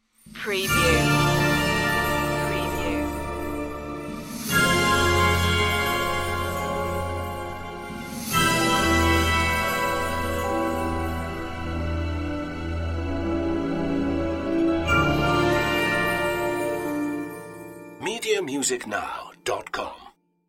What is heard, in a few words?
journey to the underworld multiple stingers no choir